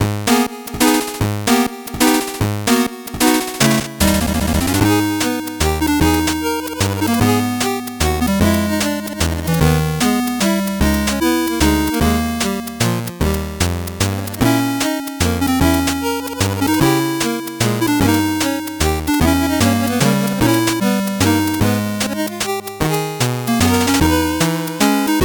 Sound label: 8bit bgm slow